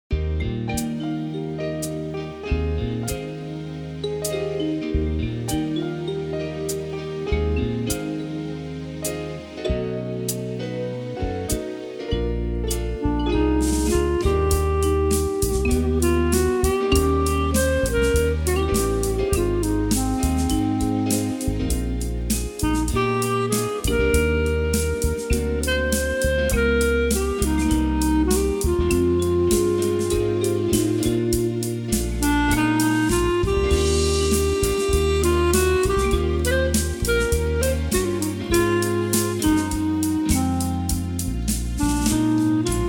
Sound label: clarinet